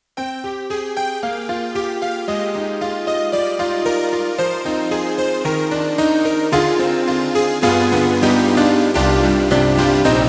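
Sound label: YAMAHA MU1000EX Synth Pad Piano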